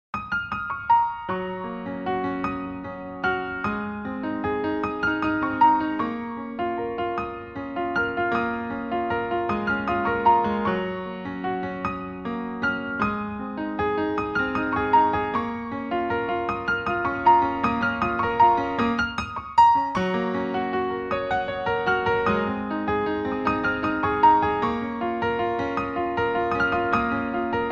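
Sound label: piano playing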